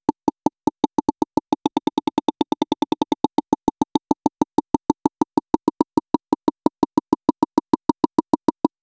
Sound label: clean modern game show so, game show